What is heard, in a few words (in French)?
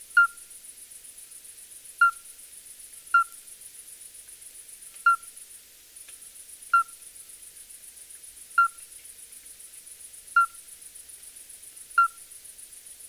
crapaud accoucheur